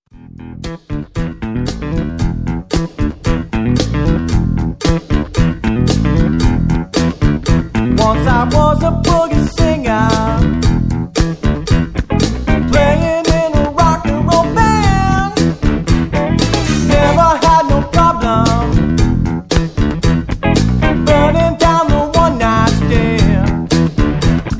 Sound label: Funky Music Mix